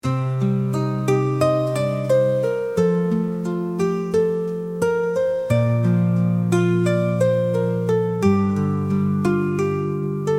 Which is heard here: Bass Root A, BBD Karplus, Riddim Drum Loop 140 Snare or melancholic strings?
melancholic strings